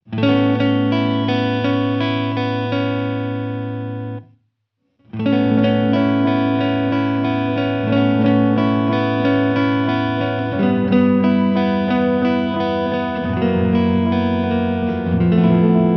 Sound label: ekopress 80